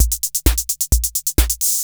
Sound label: KR33 DISCO; Crypton - DrumBase5000